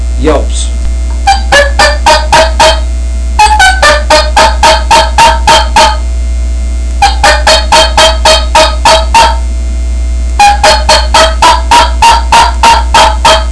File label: snuff can yelps, turkey; Southland game calls